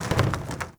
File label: Pylon Plastic Roll